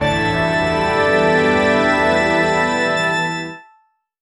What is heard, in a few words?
a grand and conclusive so, fanfare